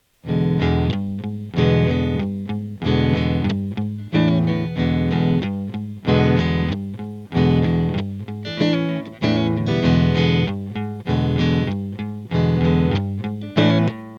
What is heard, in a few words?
rock